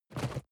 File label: cardboard box medium shake soft short; objekte